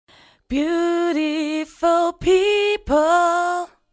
Vocal Freeze dry

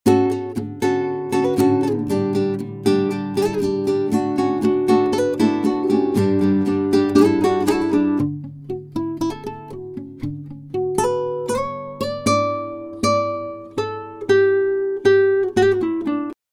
Guitar Solo DRY; RaySpace

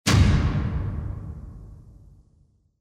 Silo Hit DRY; RaySpace

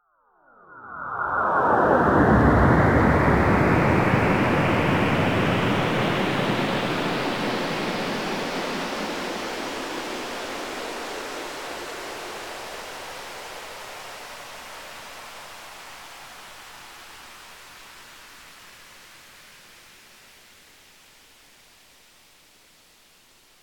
Wacky Sine FX; RaySpace

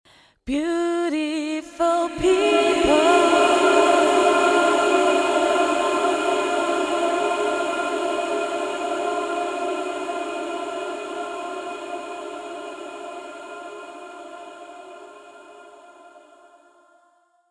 Vocal Freeze (Fade In)

